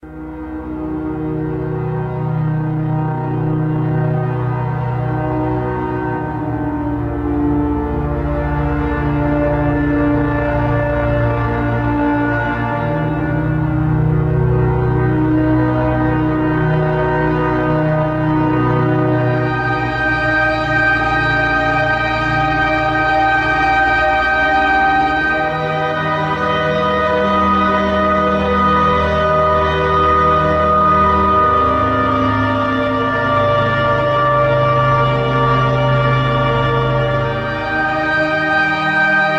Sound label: Op lead synth; Elden: Path of the Forgotten